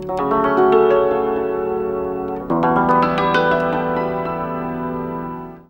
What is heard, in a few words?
ZIMB ARPEGGI; Best Service; World Colours